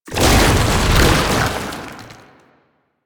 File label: Proto Infested Babau Passive Stop, Enemies